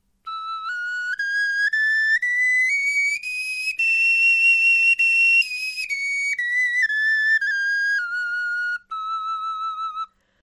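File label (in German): tonleiter, Knochenflöten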